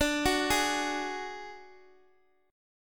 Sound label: D Major Flat 5th D Guitar Open D, chord